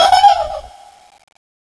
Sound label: laugh; CSO LightZombie